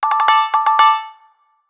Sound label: alert; Sony Ericsson; PlusFourSix; SE Sound Project; Lars Edvall; WaFam 1 Lear alert